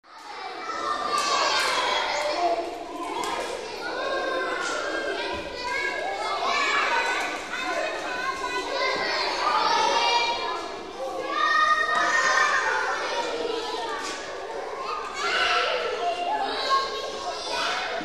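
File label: children playing at school during recess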